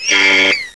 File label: pit drone die; betapitdrone